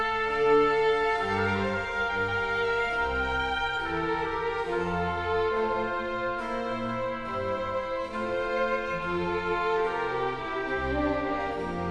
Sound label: classical